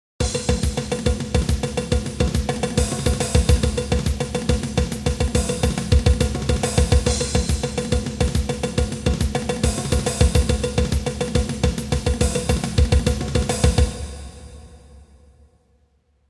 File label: swing style